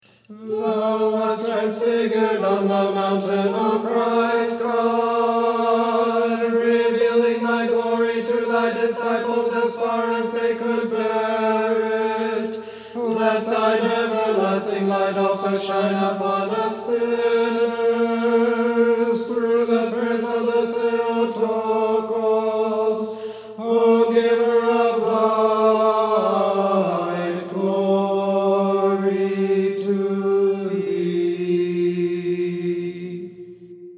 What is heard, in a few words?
Unison Melody only; Transfiguration Troparion